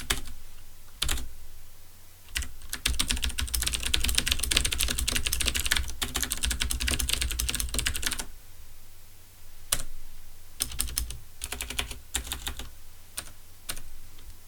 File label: das keyboard